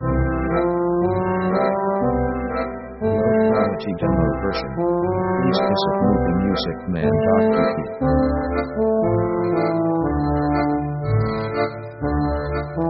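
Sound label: orchestra funny stompin; funny themes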